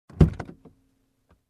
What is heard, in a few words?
Opening compartment in car